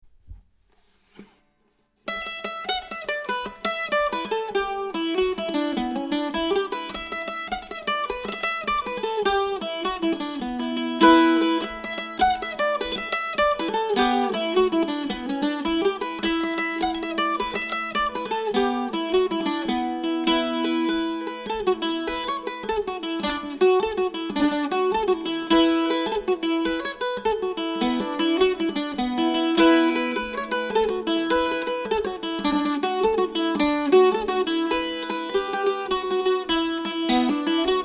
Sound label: mandola